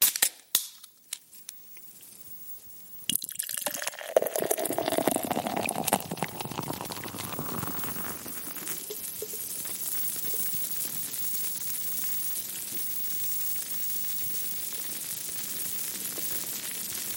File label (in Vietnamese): tiếng bia sủi bọt